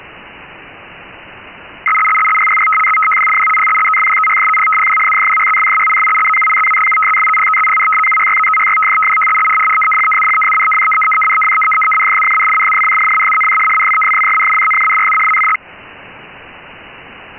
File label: RTTY; Icaro6